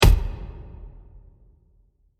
Wood Hit With Reverb; Blasts; Impacts; Crafted Signals

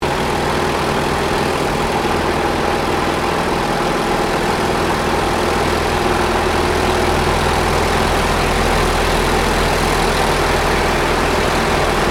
AIRCRAFT T-28D TROJAN APPROACH INTERIOR; Airplanes

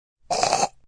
Quik Chok, Quick choking sound; Gagging

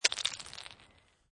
Snow And Ice Bits Of Ice Falling On Ice

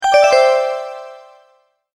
multimedia tone alert

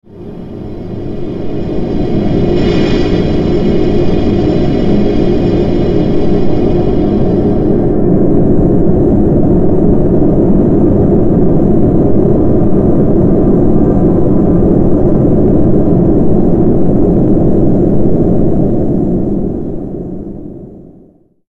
Bed Short Filter Swell Fuzz Industrial; Bed Sound Effects; Blastwave; Production Element Imaging Element Accent Transition

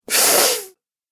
Human Male Minor Pain Sound

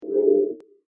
Elevator Of The Future; science fiction sound effect

